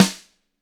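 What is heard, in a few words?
Gretsch snare drum; ILIO; Double Platinum Drums 1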